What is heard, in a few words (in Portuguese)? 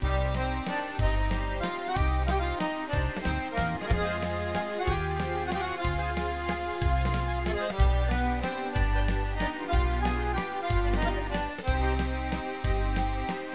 trilha; GEOGRAFIA